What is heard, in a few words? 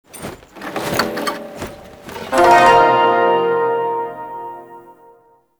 Shawzin Equip; Warframe